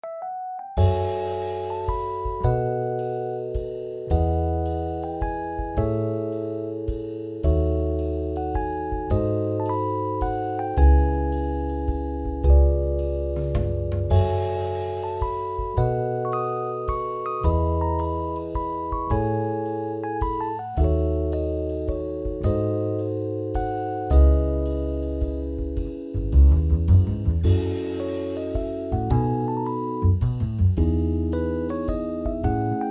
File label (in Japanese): しっとりした曲, ジャズ風